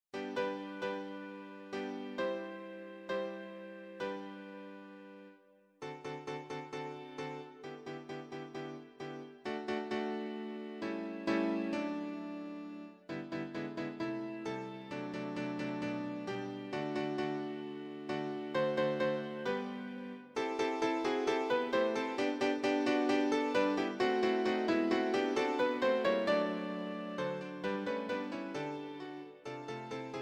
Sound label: audio sample piano